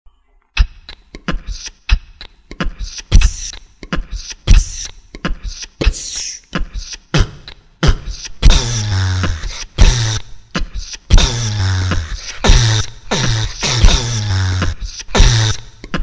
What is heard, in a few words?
beatbox professional